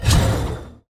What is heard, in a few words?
undying Dread travel; tower grim Wraiths